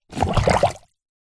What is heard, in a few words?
foley liquid plastic item movements in plastic container of water plunges